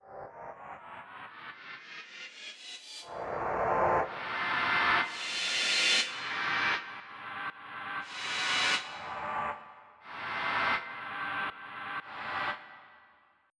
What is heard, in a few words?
Reverse Cymb; EverQuest